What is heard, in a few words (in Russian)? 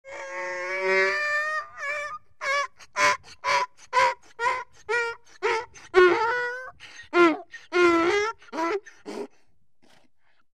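osel golos, donkey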